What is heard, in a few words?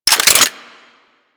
Hand Shotgun Reload Chamber, Weapons